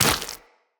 Land Flesh, Footsteps; Characters; Tenno

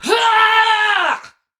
Attack Effort; Warframe; Tau; Dax; Ninja

